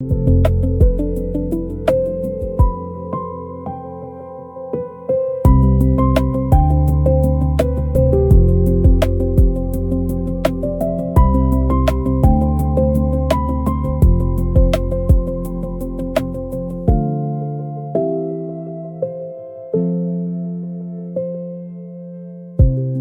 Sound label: loop